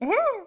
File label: Cute